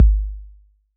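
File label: bass drum 808 Decay C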